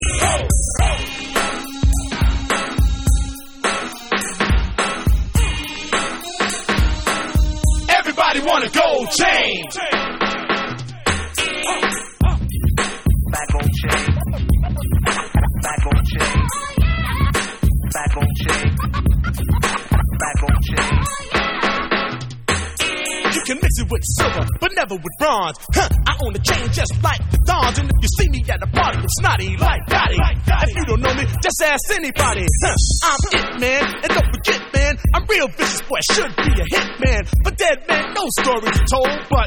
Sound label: Acapella Dub Version